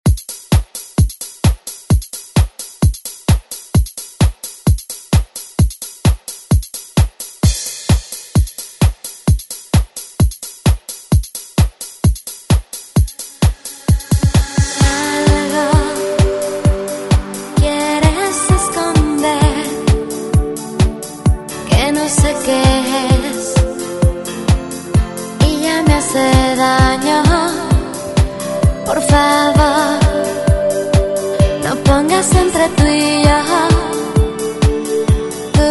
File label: 90s ReDrum; DJ Mhark